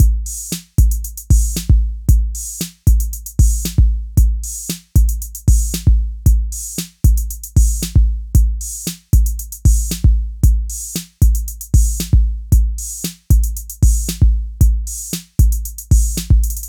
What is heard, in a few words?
drums